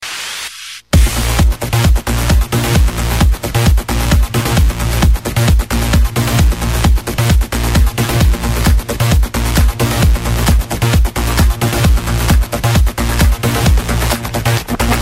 trance tone

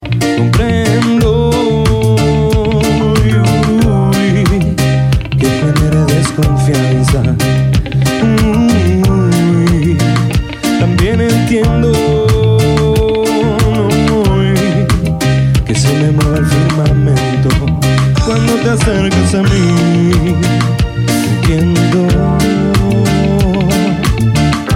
Reggae

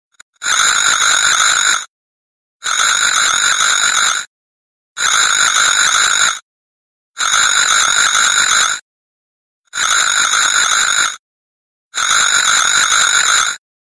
Ultra alarm

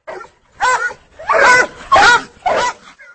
coon hounds treeing a caged coon; walker coon hounds

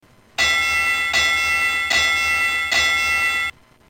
Boxing Bell

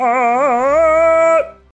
level up

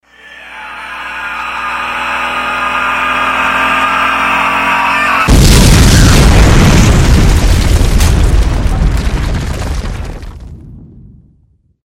crash drive

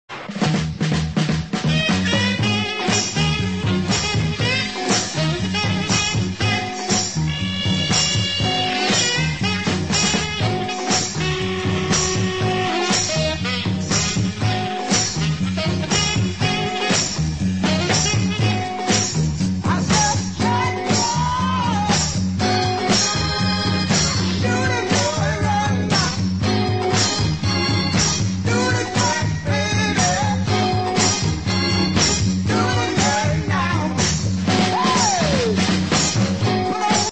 Shotgun

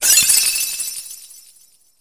Shatter; Persona

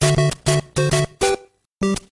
start; super mario

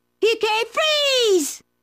Is Calling Suspense

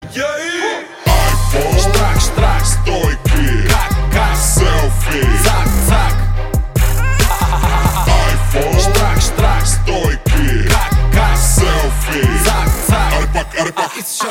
Iphone bg rap

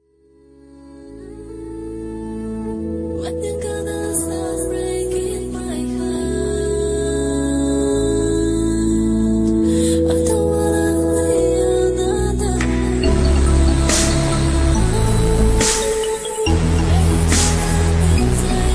dubstep remix

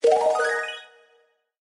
notify sparkle; htc

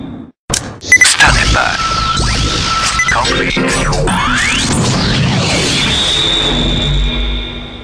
nissan gt-r; ringtones

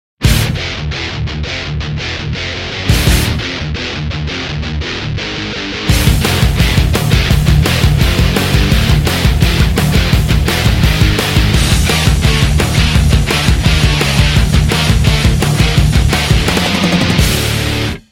Heavy metal guitar

